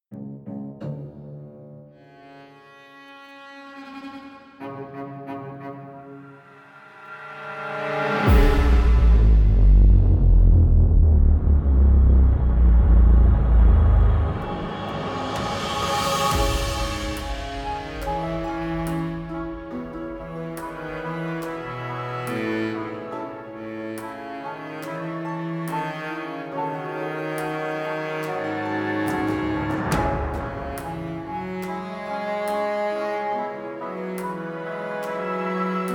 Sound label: Solo Cello; Zauberstrings